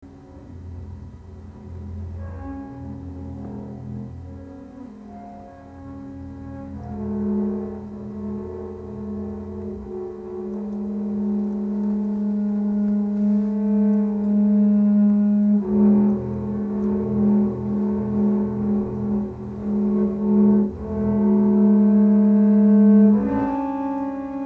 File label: TREE bass